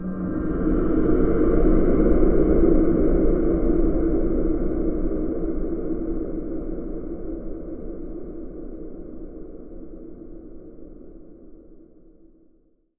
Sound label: System Drones - Dronetail